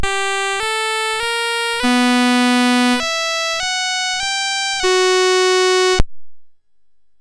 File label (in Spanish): Vals, MOS6581R3; Vals del Duende